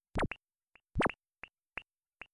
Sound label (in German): spieler schiesst spezieller schuss; jazz jackrabbit 2